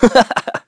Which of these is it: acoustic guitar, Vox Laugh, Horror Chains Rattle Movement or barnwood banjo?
Vox Laugh